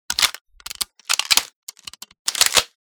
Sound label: load, weapon; metrocraft; val